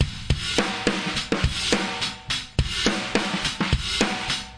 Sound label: 105 gaterock, DRUM LOOPS and BREAKS; 101 to 110 bpm